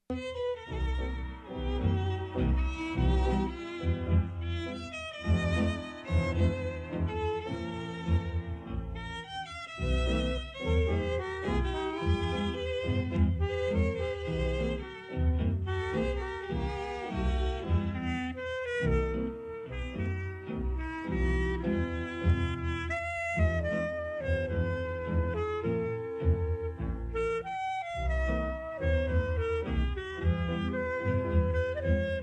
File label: Ukrainian tango